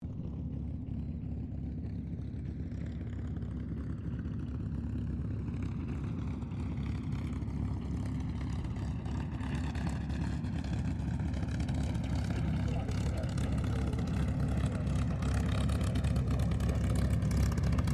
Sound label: AIRCRAFT CAC CA-18 MUSTANG MK23 TAXI SHUTDOWN; CA-18 /P-51 Mustang; Airplanes (Sound Effects:Vehicles)